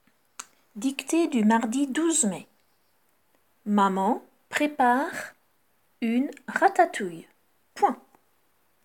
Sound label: Vocal